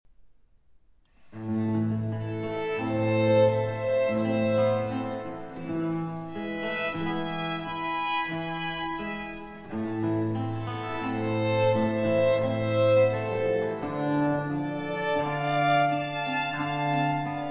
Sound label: Concerto For Recorder, 2 Violins & Continuo in A Minor, Largo